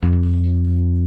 Bass, F2; th12

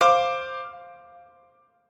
piano

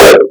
Kick hardstyle; 梁非凡

